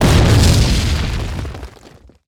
Explo Missile Rock Wall; Destruction

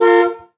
91 Firebird Horn; Automobiles

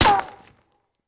Bullet Ricochet; Sound Ideas; Guns

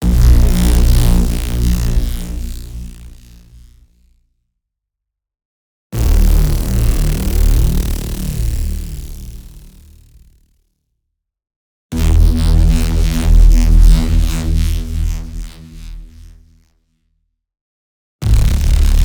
BLAST Brutal Force; NEON; boom library

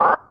warning Lane; Space Wave Race

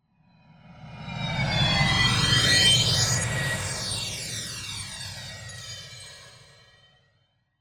Space Ship Passing Fly By - High Speed Sci-Fi; Hollywood Edge; sound designer's toolkit v2

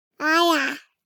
BABIES TALK Boy 12 months Iyaa; Baby Boom

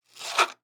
X-Ray Machine; Medical; Blastwave

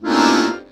Cartoon Accordion Squeeze; SFX Kit; Foley - Misc

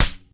Menu Mechanical

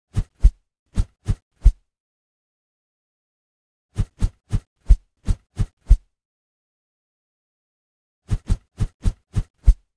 Swishes (Deep), Martial Arts; Hollywood Edge; Martial Arts and Human Impacts

